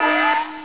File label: siren